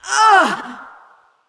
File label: Creature Wound Critical; Eydis